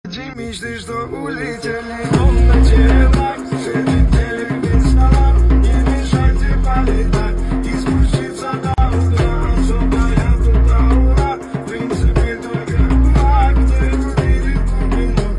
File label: Caucasian version